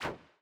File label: swing; gnome